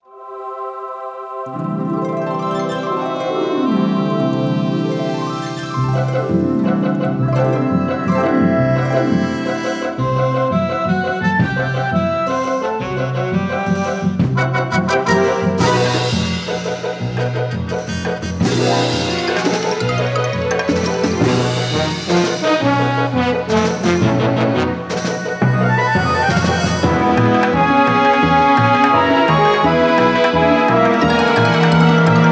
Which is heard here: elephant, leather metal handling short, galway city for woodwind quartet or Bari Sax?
Bari Sax